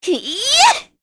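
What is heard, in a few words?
Attack, Korean; heroes; Shamilla; kings raid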